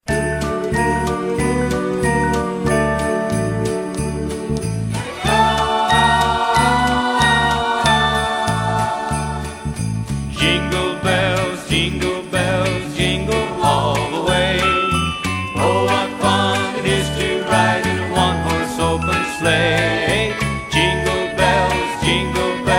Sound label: jingle bells